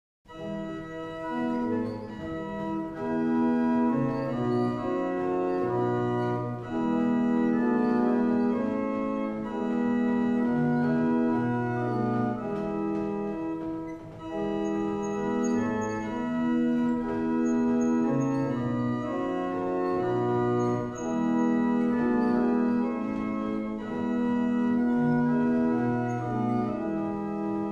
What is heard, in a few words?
Double chant, barrel organs; Wissington; Robinson